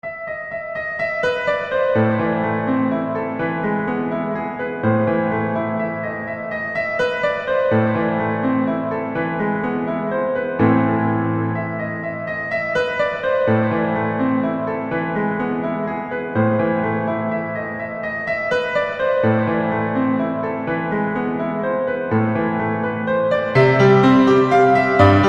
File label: fur elise piano faster